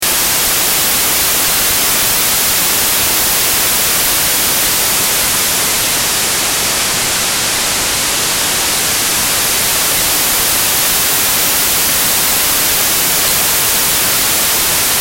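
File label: high pass move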